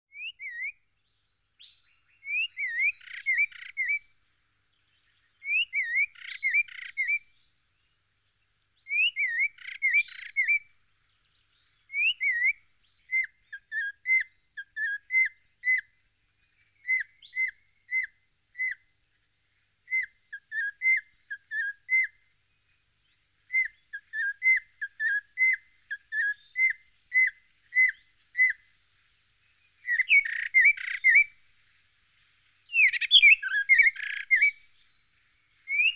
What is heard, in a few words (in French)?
Cossyphe choriste; chants d'oiseaux; Jean C. Roche